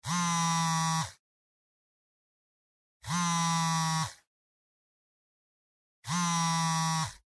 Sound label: Cell Phone Vibration Hand